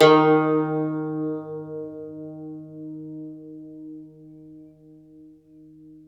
CUMBUS D#2, Cumbus

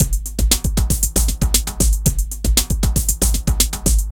drum loops

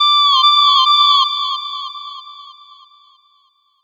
Misc Short FX

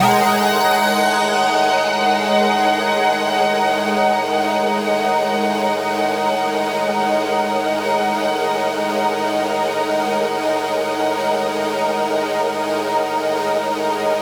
Long Atonal Pad

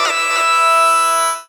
O SYN 1, Roots Funk; USB Soundscan vol.41; AKAI

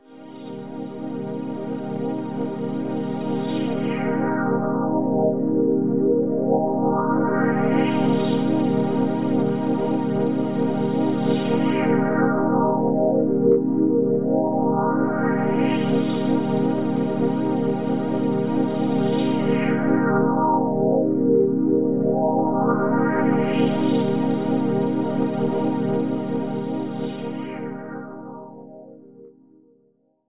Pad C2, Club Techno; Zero-G

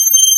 Bender G7; Proteus 2000; Digital Sound Factory Proteus 2000

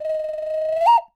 BIRD CA, JUNGLE SOUND; Voice Crystal - Steve Reid Definitive Percussion

